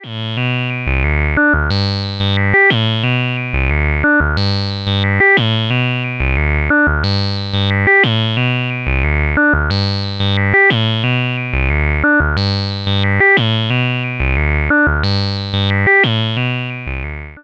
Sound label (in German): Zweistimmig; Rossum Electro Music Evolution